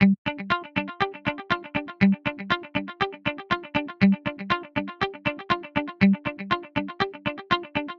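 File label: Guitar Rock G minor 120bpm; Guitar and Bass; Ableton; Factory Packs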